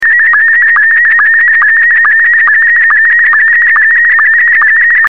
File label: SSTV